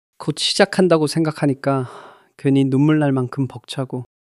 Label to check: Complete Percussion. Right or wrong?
Wrong